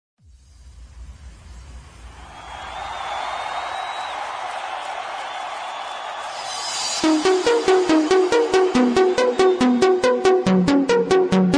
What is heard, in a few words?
techno mix ringtone